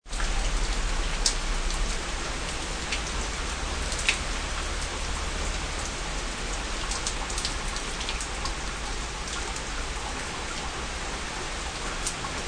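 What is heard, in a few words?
Rain Drip Porch, Recording of rain shower; Rain (Sound Effects:Weather)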